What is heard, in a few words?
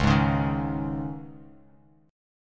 C sharp Suspended 2nd C sharp, chord; strummed; 9,11,11,8